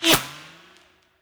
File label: bullet by subsonic; weapons; nearmiss; Counter Strike Model Pack; CS2